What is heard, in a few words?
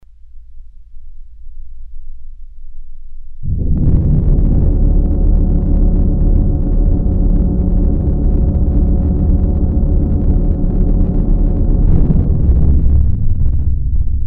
ambient